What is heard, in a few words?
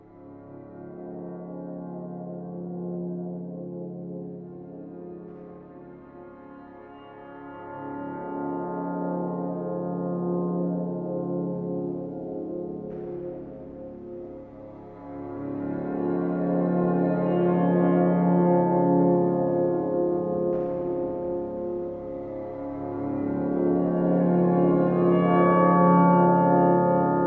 bowed guitar tape manipulations on shore; visulahti